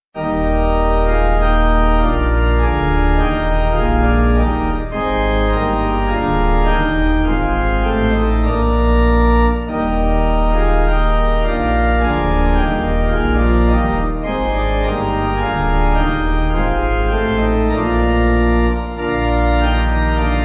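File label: Pipe, pipe organ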